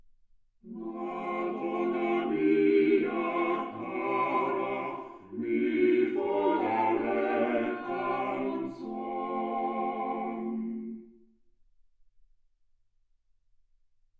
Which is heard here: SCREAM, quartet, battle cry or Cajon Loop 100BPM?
quartet